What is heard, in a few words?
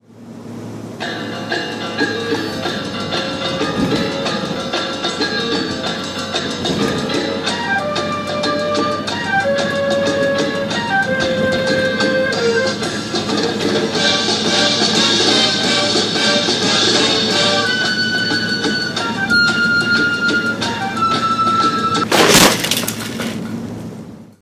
Riding in Motorhome